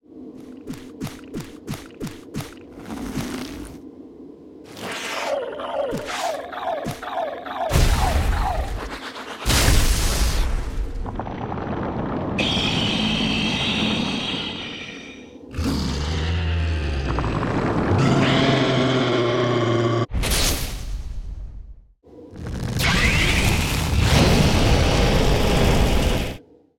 Appear

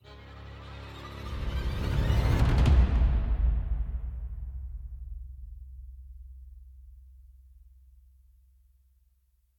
Action Run 100bpm Intro; project zomboid

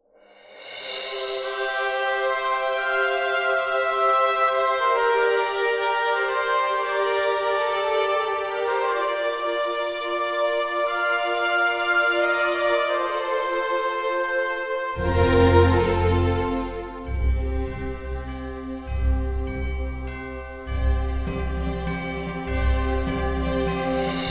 Harmonic-Celebrate; Antagonist

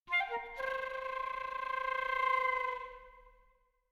Deep Hole Dive

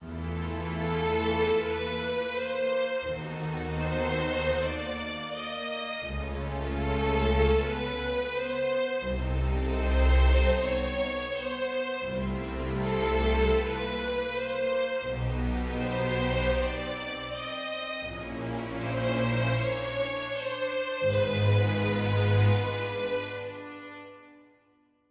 dark forte

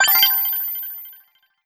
Achievement; Bonus; Terra Swoop Force

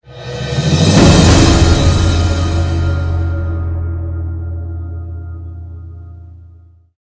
gameplay levelup; Inferno Climber

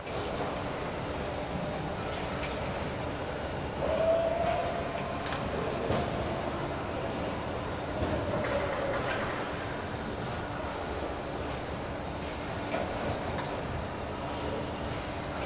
Ambience; NODE Ambience; Grimrush

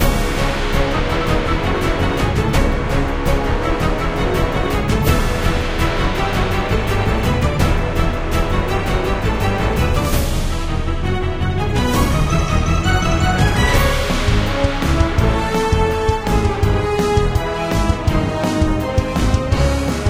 arena battle music; EvoCreo; Android game music

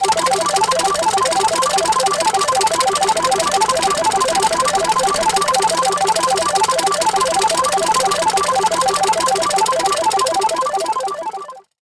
jackpot spin; gamerip; Pachi Pachi 2 On a Roll; PS4, PS5, Switch

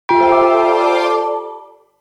Jingle Fortune Hall; culdcept revolt original soundtrack dynamix 2017

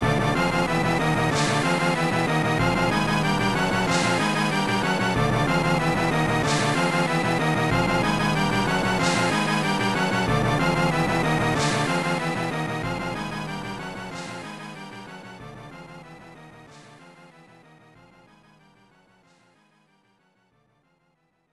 Continue; Warriors of Fate

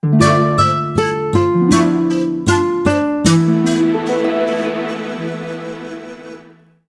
Lose; Shiren the Wanderer: The Tower of Fortune and the Dice of Fate; PS Vita

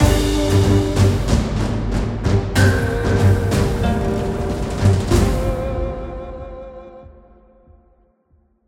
intro stinger; biomutant; myriad tribewar

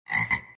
Ribbit

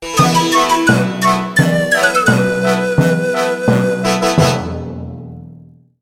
Win; Shiren the Wanderer: The Tower of Fortune and the Dice of Fate; PS Vita

